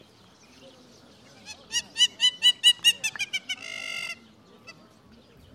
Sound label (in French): ombrette africaine